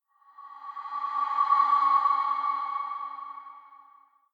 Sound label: MUSIC HORN EMPTY; adams venture origins